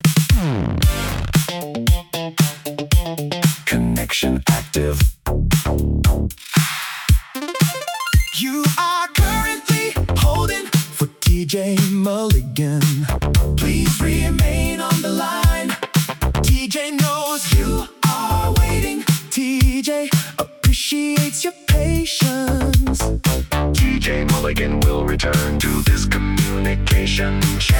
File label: Retro Synth-Funk